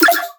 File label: synth hit Computing Noises; Virtual Riot